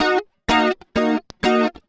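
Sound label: guitar; juno9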